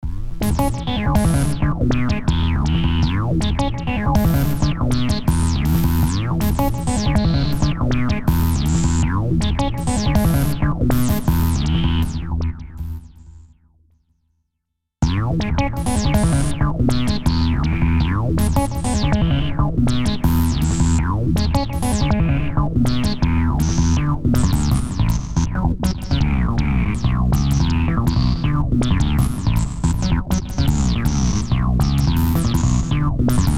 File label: Bass; Batik